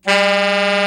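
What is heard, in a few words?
MULTIPHONIC, TENOR SAX; Northstar - New Gold VOL-1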